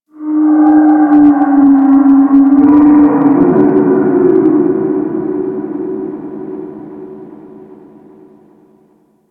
Ocean Whale Call; Warframe; Grineer Ocean

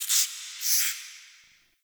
Under City Tiny Creature

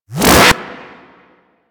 Charge Gun Shot Retract B; Crp Charge Gun

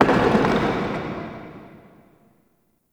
Distant Stone Texture, Crypt Ambience; One Offs; Champion Crypt

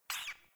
Animal Rescue Trapped Hare Call; Encounters

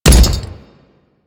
Step Default

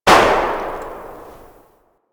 Magnum Tail 2D, Weapons; Warframe; Tenno

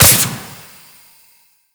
Jetpack Thruster B; Warframe; Corpus Jetpack

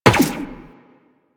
Run Zipline, Footsteps; Warframe; Tenno

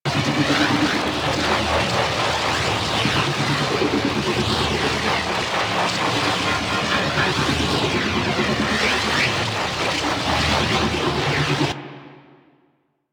Alchemist Transmute Ability Loop; Warframe; DE; Warframes

